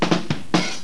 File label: RIMSHOT; HUMOR